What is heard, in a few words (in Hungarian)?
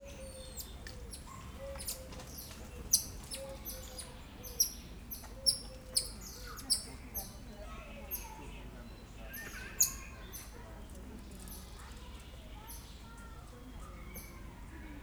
intenzív hangadás, aranyfejű oroszlánmajom; szegedi zoo 2011